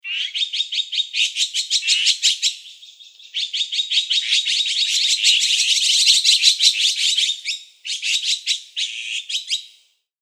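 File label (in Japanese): オナガ, 鳴き声 鳥 野鳥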